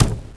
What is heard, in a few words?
thud box